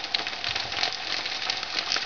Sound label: sizzle